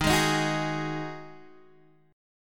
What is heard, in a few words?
Eb Minor Double Flat 5th, down, Guitar, DADGAD